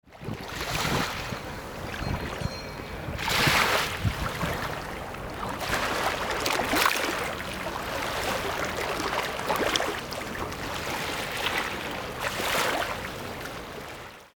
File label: Water Lapping